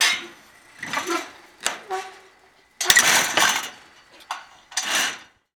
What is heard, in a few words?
Gate Metal Fence Close; Doors